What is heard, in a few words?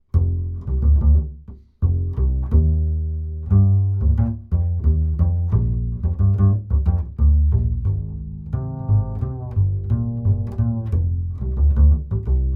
ACC BASS FET